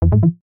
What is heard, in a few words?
pause back click; osu skins; Luxariah Default Minimal